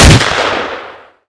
saiga fire

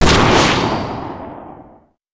stealth tank fire; nod

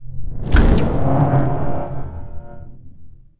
sandstorm mlrs start; gdi

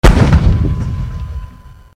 nod scorpion fire

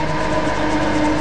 nod stealth tank loop

